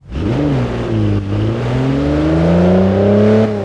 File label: first, vehicles; gmod; tdmcars; rav4